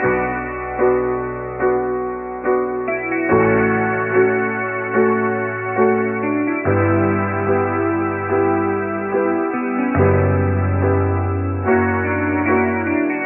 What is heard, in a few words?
guitar and piano, Loops, Sad